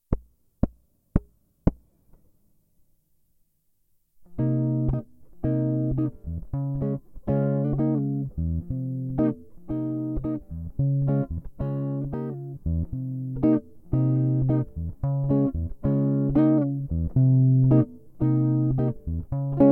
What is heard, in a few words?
phrygian